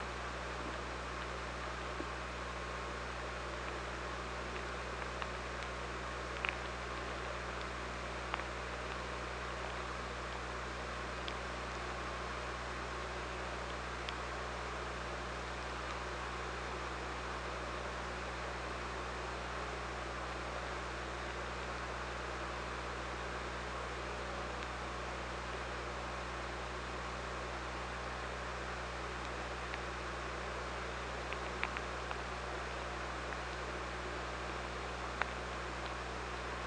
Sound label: OrcaLab